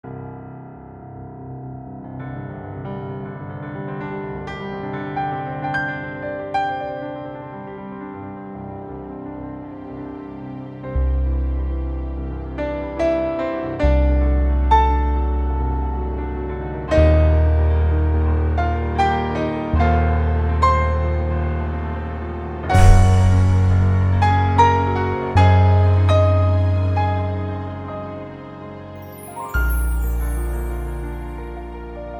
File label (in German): Konzert Jingle; der verflixte beethoven